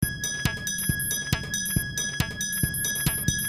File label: Trippy Techno DnB 138